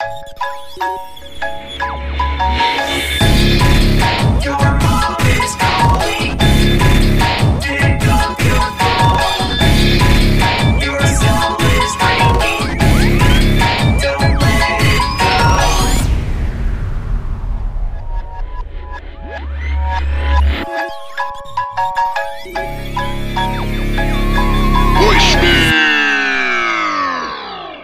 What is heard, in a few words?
cool modern ringtone; Mom Mother Calling